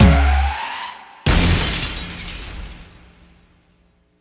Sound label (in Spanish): Choque Carro